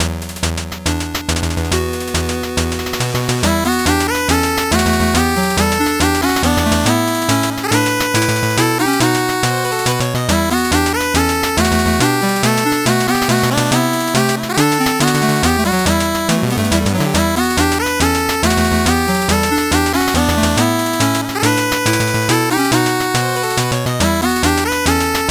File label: 8bit bgm normal